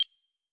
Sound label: machine, Machine Electro Hits; Hip Hop; STYLE KITS (Hip Hop, Etc)